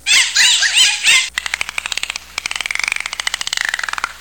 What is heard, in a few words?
dolphin